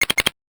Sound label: Slot Machine Modifier Score